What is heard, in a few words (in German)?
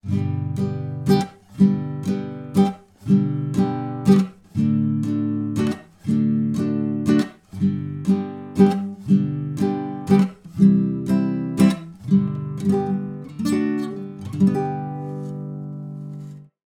Gitarrenbegleitung